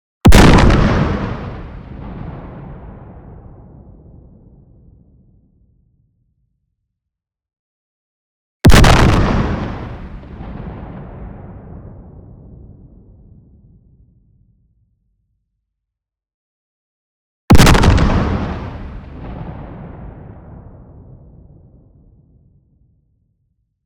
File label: EXPLOSION LONG Planet Smasher; boom library; Anime Essentials